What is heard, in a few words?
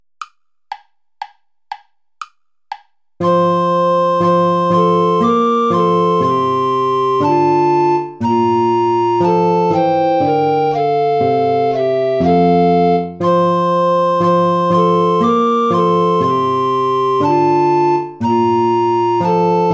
how rose blooming play along without alto recorder; praetorius michael